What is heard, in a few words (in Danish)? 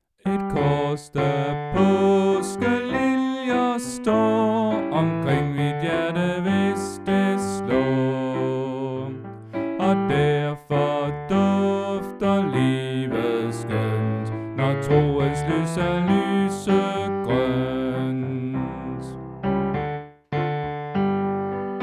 Bas